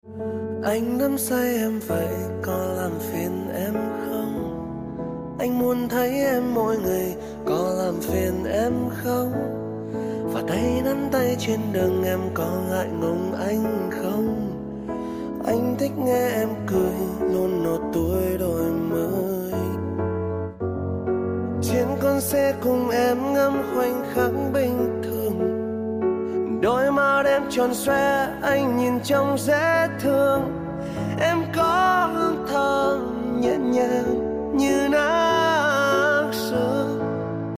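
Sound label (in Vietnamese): Piano, ringtone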